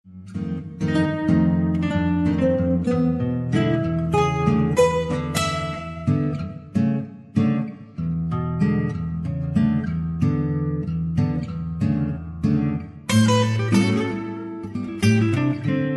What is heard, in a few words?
acoustic guitar Acoustic Blues